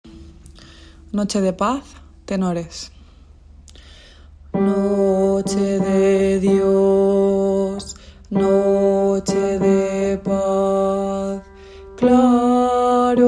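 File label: tenor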